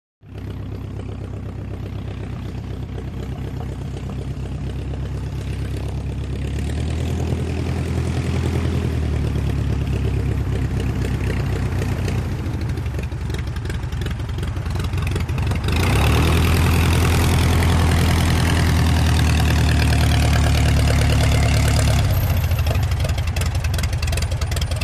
Tiger Moth: Taxi In / Off; D.H. 82 Tiger Moth. Idle In Distance, Approach, Sputter With Metallic Ringing, Off. Close To Medium Perspective. Prop Plane.